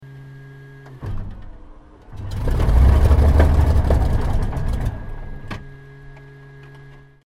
aircraft 1944 supermarine spitfire mk xvi miss start interior stereo